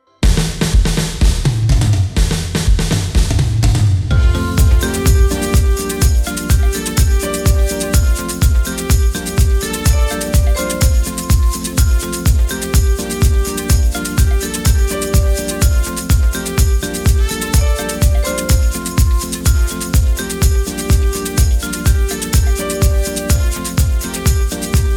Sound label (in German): Klimaglocken drums